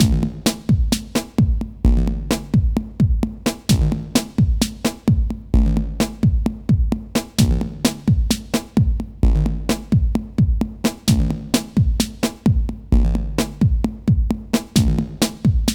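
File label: drum n bass, Funky Xtreams; INIS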